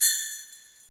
Jing Bell, Percussion, Yamaha DTXpress

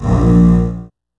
DOUBLEBASS A#1, Virtuoso Strings; ILIO; Kirk Hunter Virtuoso Strings VOL-2; 90 s Sample CDs; 5DOUBLEBASS

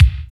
KICK, Tekno Industrial; East West

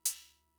Cymbal, Fricke MFB-522, Drum Machines

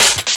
Fill, Hard Stepz, drum loop hits; Zero-G Jungle Warfare 1, 2 & 3; Percussion Hits

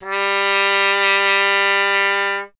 trumpet, G 0; Miroslav Vitous; Solo Instruments VOL-1

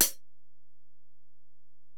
HiHat, Alesis DM-PRO

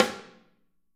Snare OH; Snare OH All; Drums Percussion

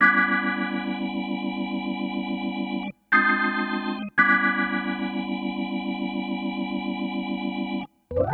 Cruisin' Disco Organ, 70s Analog Funk; Sonic Foundry (Sony Creative Software); What it Is!

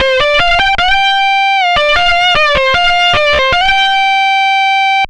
Disto Guitar, Guitar Synths; Dr. Fink's Funk Factory

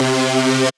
Synth, DnB One Shots

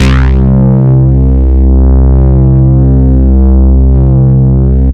SYN BASS

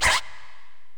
Korg x5, Drums

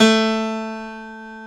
PF, GRAND PIANO; AKAI S6000 CD-ROM - Volume 1

